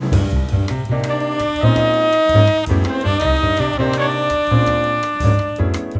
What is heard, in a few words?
quintet